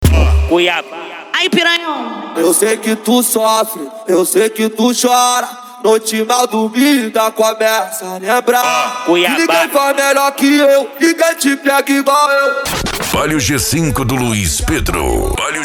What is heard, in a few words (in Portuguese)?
funk mega bass e forro; urupa ro